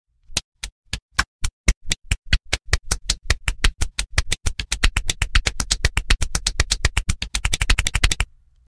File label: cheese slap meme; Voicemod